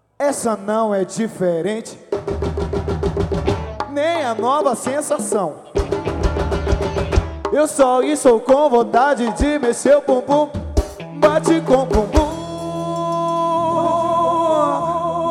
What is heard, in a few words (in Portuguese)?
swing